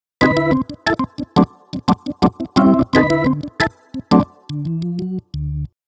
enhance hi organ; u-he uhbik

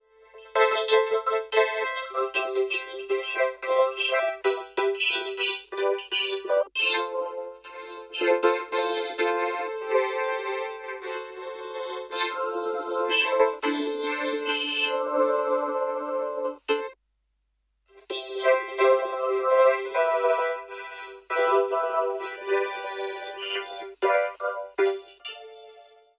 vocodizer; plugiator

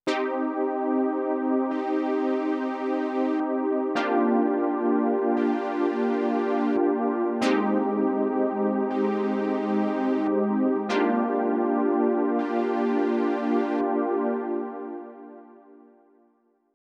pad reso 24 alt 12 lowpass; Clavia Nord Wave

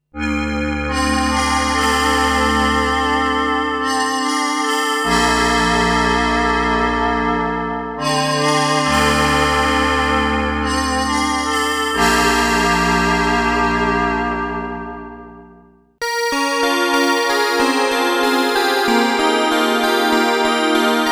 pad solo; Prophet-VS; solos pads hybrides; arturia origin

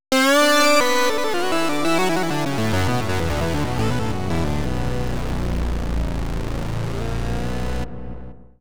delay

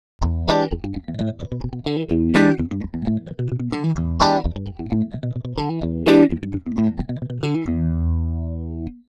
down guitar; u-he uhbik

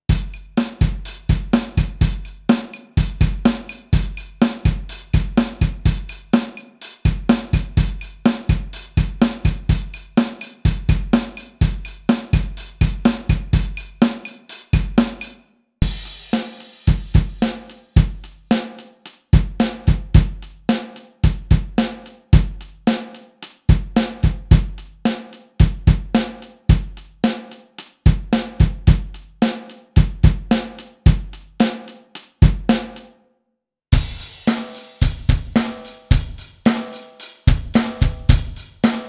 audio drums ac, kurzweil pc361

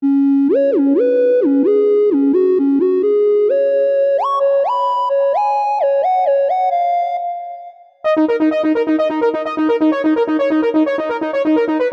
sound synth solo; yamaha tyros3

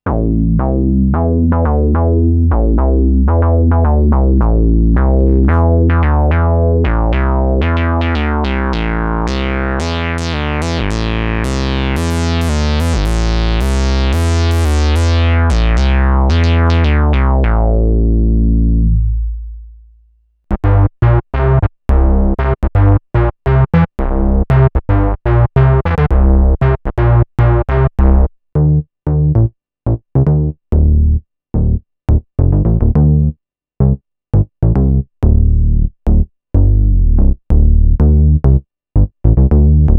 mini bass; Minimoog Voyager; arturia origin; basses

